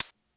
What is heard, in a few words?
Maraca; Lost Tapes From Mars